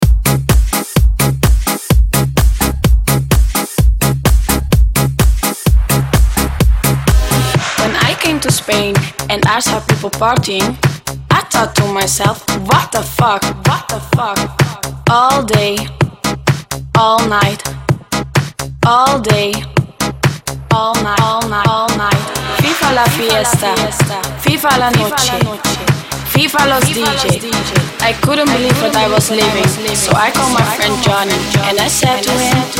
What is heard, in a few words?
Moombahton Transition 130-108